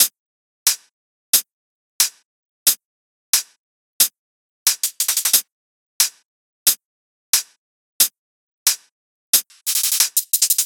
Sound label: Trap 90 Hat; Drum Construction Kits; Loops; Ghosthack; Drum Mega Bundle Demo; Drum Hero 5; Trap 1